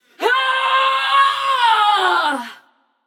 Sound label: battlecry passion; chaac